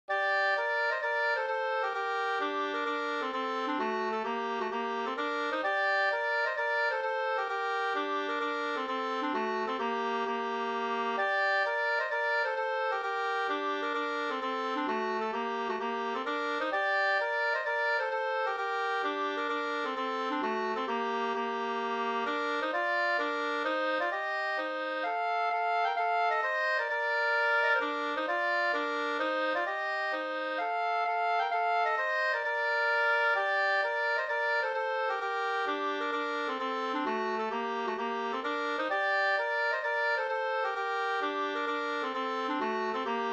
hambopolska gmoll 2st

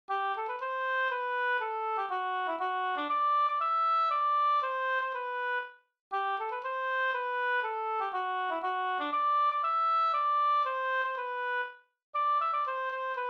polska

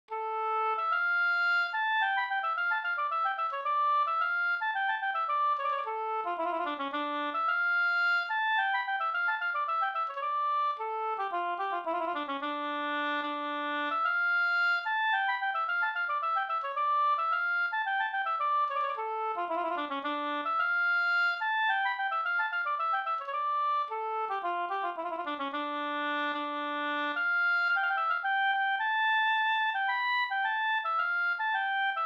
polska Bingsjopolska brudpolska; Malargubbens brudpolska e Pekkos Helmer

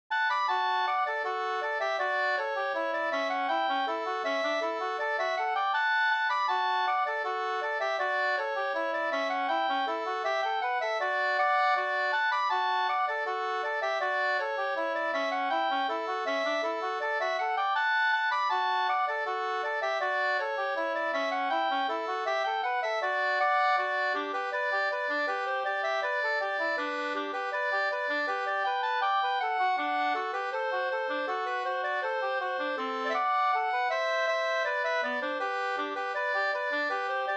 reinlender

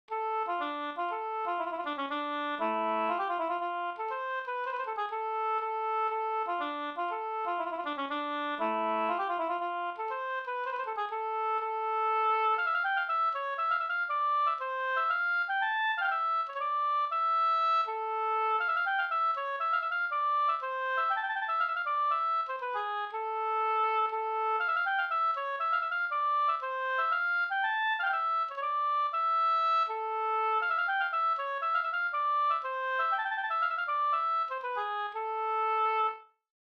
polska Bodapolska

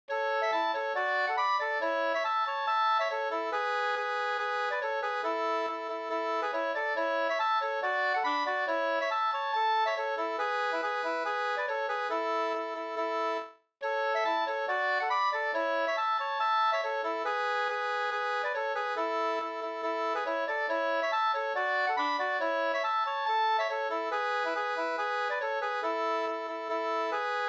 schottis 4-4 Adur

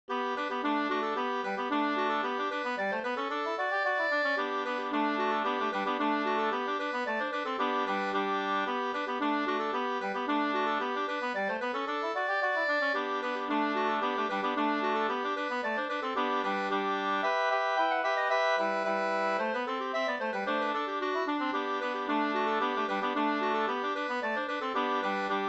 kadrilj

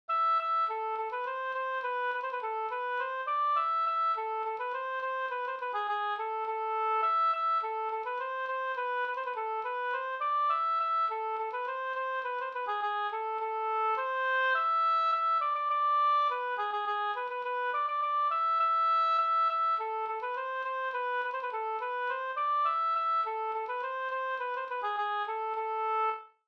slangpolska

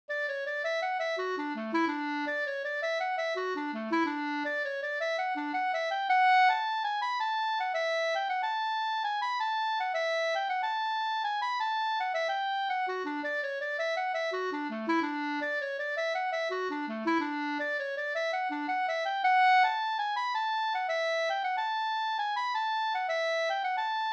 halling; fr Hallingdal Fjeldm2u002

